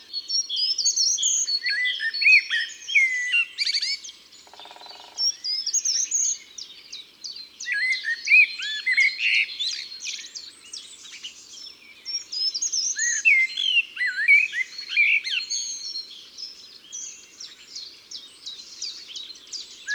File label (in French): Grimpereau des jardins Chants d'oiseaux des bois et des forets